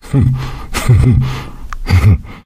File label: laughter; source engine; left 4 dead 2; survivor